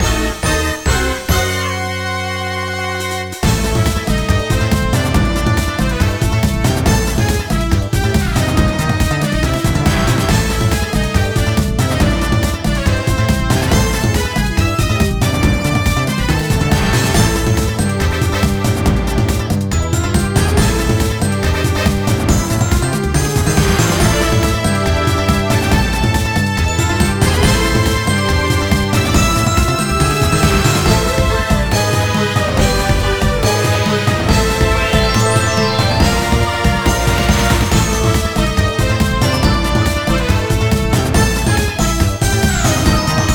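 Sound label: background music map boss raid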